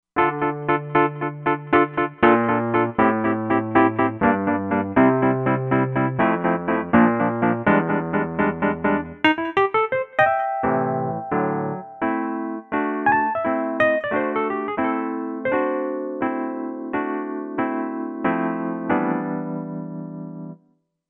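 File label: Wurli Amp, synth piano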